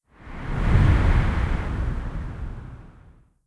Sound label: Wind; blue archive